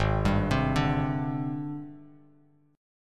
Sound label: Ab Major Sharp 11th Ab down Bass Standard; AbM#11 arpeggiated; JGuitar